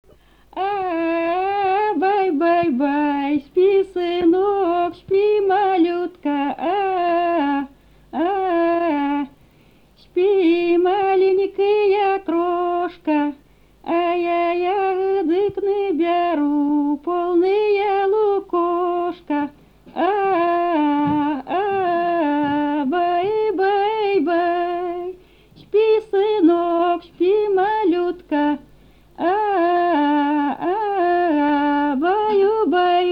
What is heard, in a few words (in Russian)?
колыбельная; Русское Поозерье; Прибабульки; А, бай-бай-бай-бай - Юра, Юра, Юравей; Фольклорный фестиваль имени Ольги Сергеевой